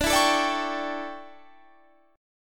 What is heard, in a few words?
D Major 7th Suspended 2nd Suspended 4th D down Guitar Standard; DM7sus2sus4 strummed; capo=3